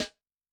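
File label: Snare Ambience, Snare; Yamaha DTXpress-II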